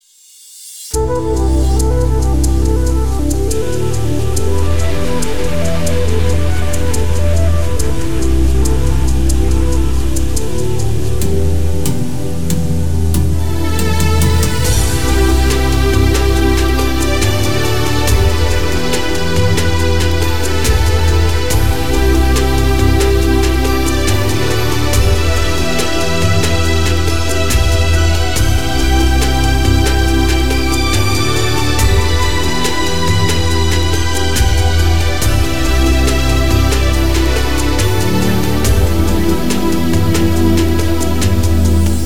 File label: level music; Druids: Battle of Magic